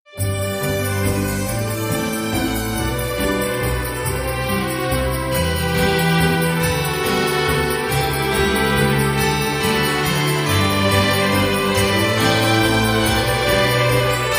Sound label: Celebrating Christmas Enchantment